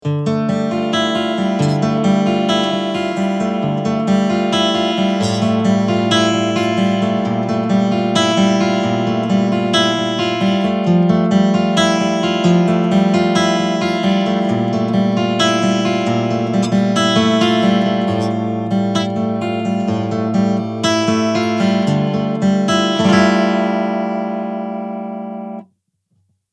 Digital Acoustic Standard

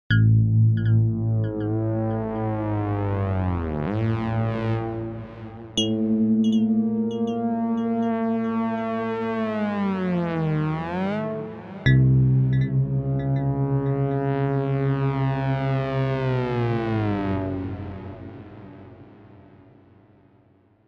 CLASSIC FM

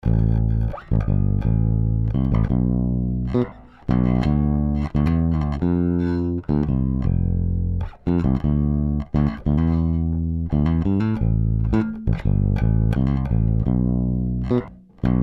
Bass Twin Tube

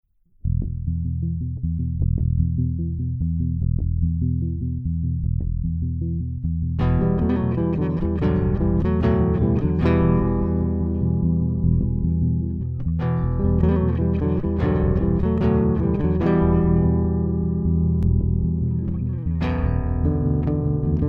Fender P Bass; 5 Tracks

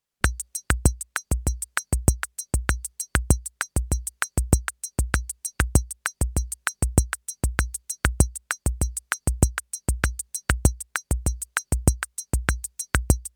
Roland CR-78 Bossa Nova

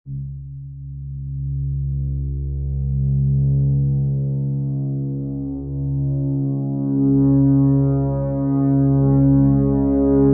Multi Sample Reverb; EIII; 44kHz

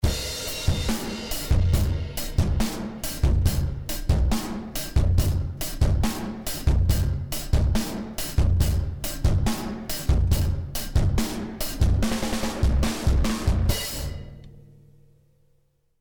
Elysia Karacter Drums Extreme

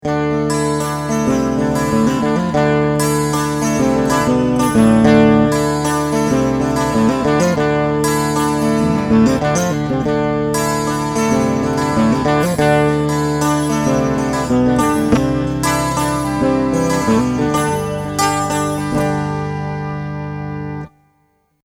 Sound Mode Brilliant Clean

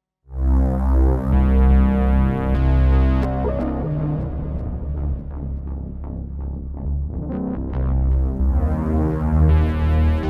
Montage

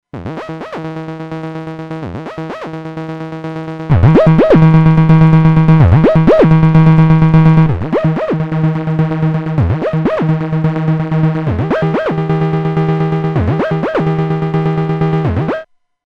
4x Saw; Acid8 MKIII

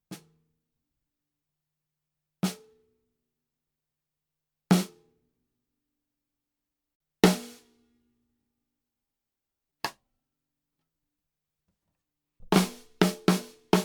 TAMA LST1365 too low

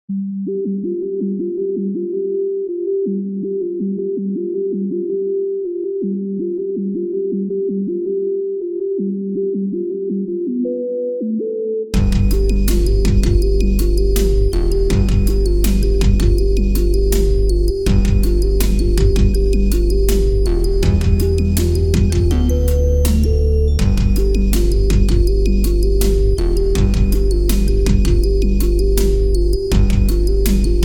Softsynths mix Relab QuantX; Savant Audio QRS

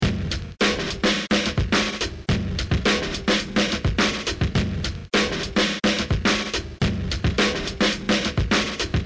Chembeat Fuzz

